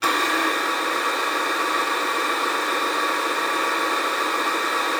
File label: luft, alto sax; Xsample VOL-2